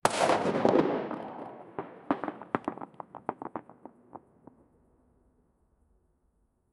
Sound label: bow shot and then arrow